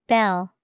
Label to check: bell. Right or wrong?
Right